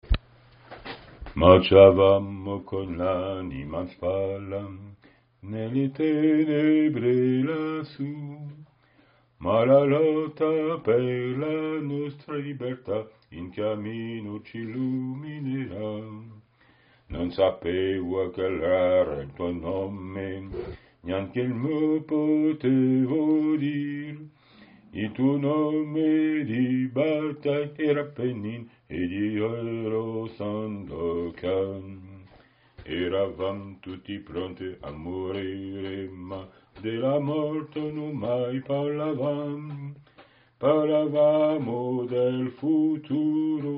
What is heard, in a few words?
bass